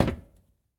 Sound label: metal step; Golden Crayon Sounds